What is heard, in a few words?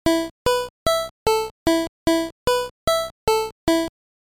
Unused Jingle